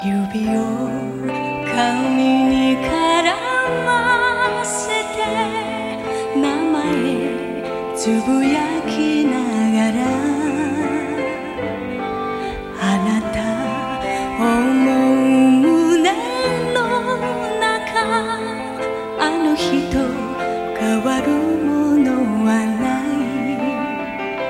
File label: Heavenly Voice